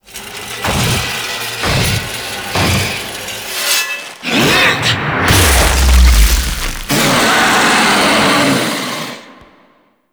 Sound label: Intro SFX; Lech Kril; Boss Intros; Cinematics